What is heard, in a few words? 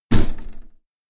Window; Decay: The Mare